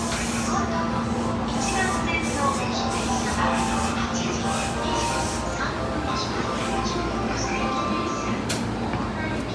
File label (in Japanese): 京浜東北線川口北, train sounds